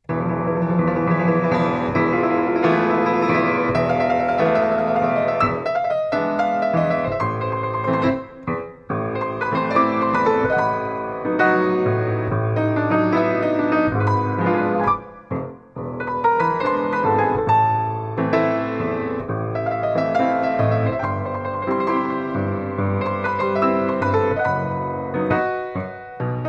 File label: tango